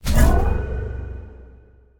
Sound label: passive heal; smite; cerberus